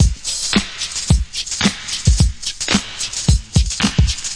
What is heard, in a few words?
classic brk; VIBRIS CLASSIC BREAKS